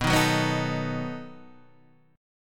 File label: B 7th B down Guitar Half Step Down